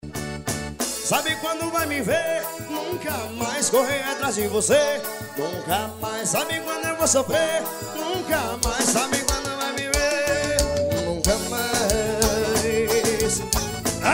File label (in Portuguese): forro vaquei